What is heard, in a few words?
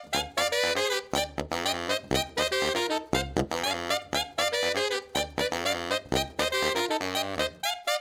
Horns Section